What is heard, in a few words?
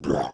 run, monster evil eye